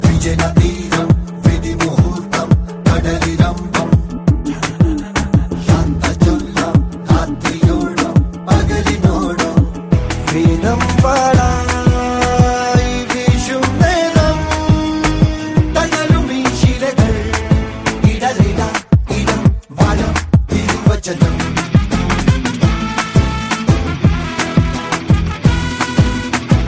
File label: amazing malayalam theme ringtone